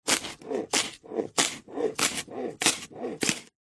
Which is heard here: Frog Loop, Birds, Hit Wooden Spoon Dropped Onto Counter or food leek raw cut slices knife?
food leek raw cut slices knife